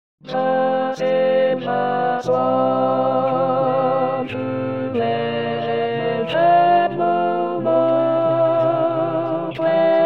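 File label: Silver Swan Alto 1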